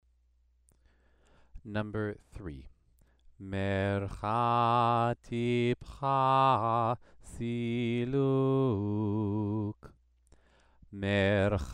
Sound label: Torah trope exercise